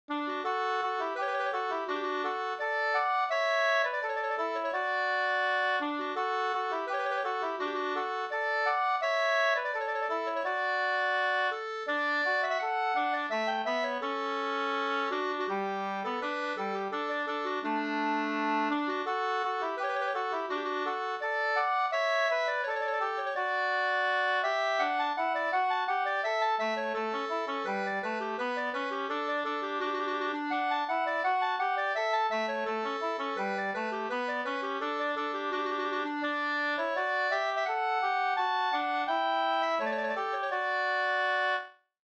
skanklat

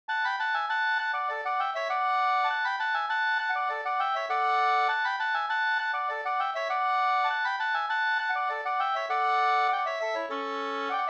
halling; utl av Ole Hjorth 2st

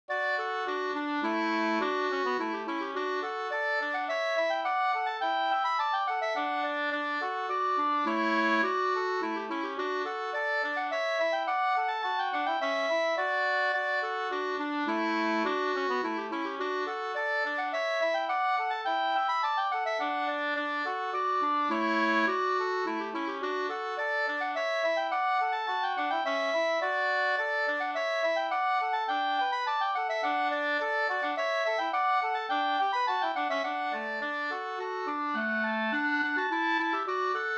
slangpolska

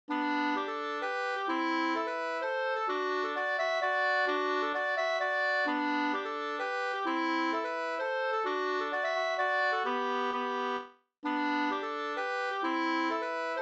hambopolska

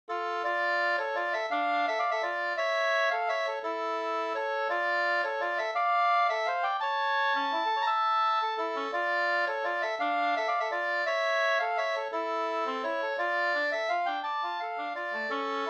vals Mollvals

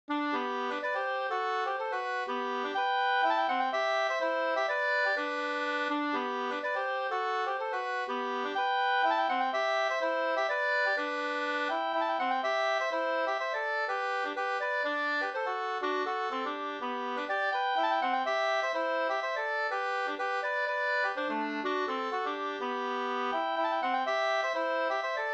hamburska, 2st; Nar jag dansar med min lilla van; Leffler 38

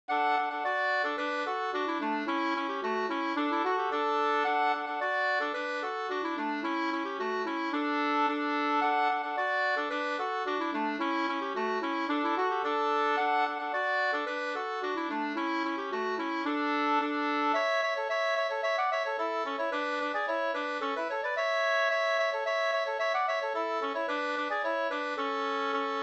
folkdans, Reel; Virginia

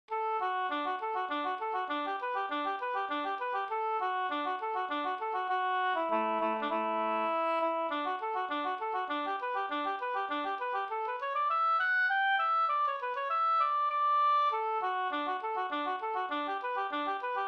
slangpolska storpolska

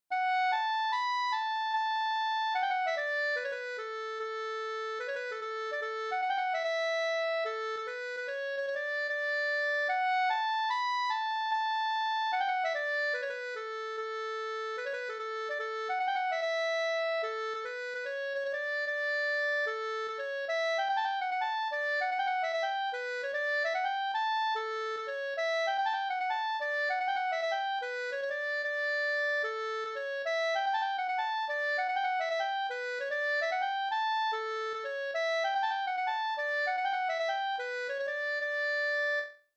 mazurka; Byrtnes masurka no

